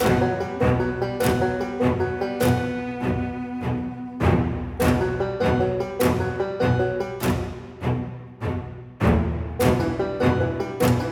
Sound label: High Action Loop 100bpm 16bar; project zomboid